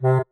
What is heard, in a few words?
marimba penguin; ゆめ2っき